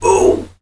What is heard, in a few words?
ohh; super trench attack 2; boss